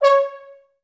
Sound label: TB STAC C#2, Advanced Orchestra; Best Service; Peter Siedlaczek's Advanced Orchestra